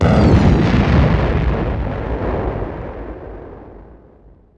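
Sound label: combat explode a wing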